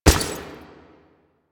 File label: Step Default